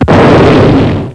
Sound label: kaboom, war